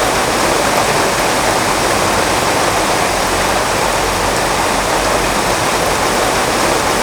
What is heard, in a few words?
rain; fgdata